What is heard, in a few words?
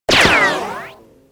ricochet; gesource; physics; impacts; ricochets